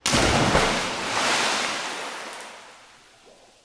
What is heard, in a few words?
Splash, Water; Barotrauma; Subsurface